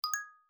Siri Stop Success Haptic, iOS Sounds

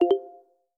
Audio Focus Enter Mode; iOS 26 Sounds

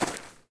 grass step; Golden Crayon Sounds

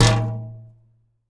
wall glass; Golden Crayon Sounds

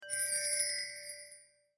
Mention Sound; Elten

